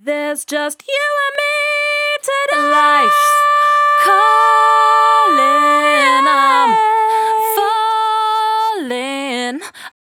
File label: 68bpm D, Velvet Vocal; Zero-G